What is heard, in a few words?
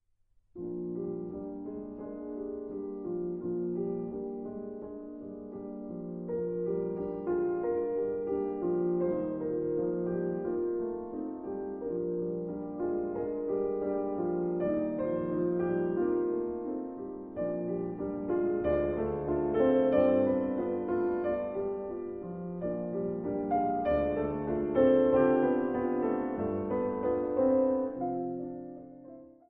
piano, 2 pianos